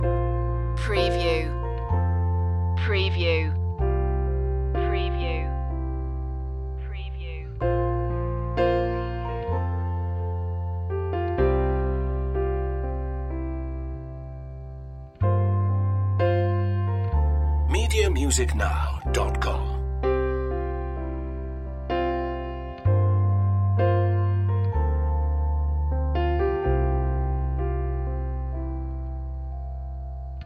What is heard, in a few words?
crashing waves loop